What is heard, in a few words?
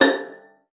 VIOLIN; Xsample VOL-5; 90 s Sample CDs; VIOLIN FING